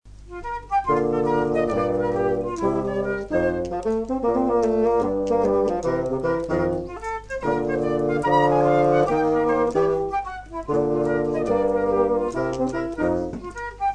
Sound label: Jazz Piece; Fl, 2Bsn; recorded during the Northern Winds concerts